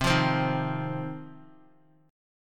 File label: C Minor Double Flat 5th, C, Guitar; strummed; chordshape; Cmbb5 strummed